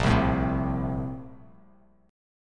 A sharp Major 7th Suspended 2nd A sharp down Bass Standard, A#M7sus2 chord; strummed; JGuitar